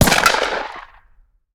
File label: Bullet Impact; Sound Ideas; Guns